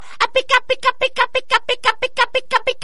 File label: mexican sad tone